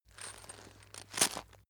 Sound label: chain mail movement